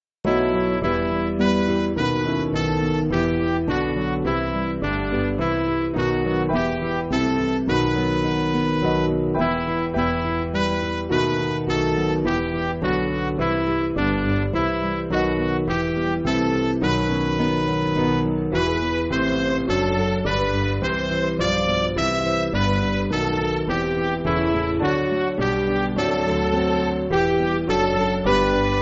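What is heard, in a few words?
Midi, Music Backing Files for Churches; God Rest You; English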